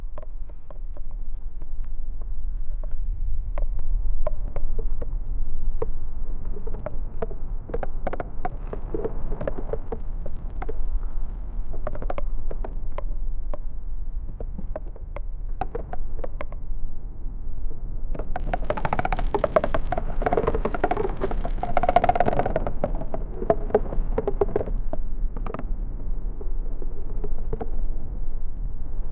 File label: a soundscape of oars